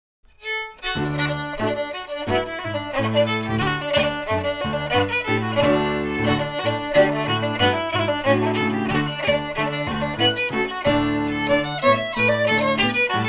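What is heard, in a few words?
Reel, Scottish Fiddling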